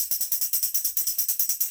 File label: Tamb Loop 140; Audacity; loops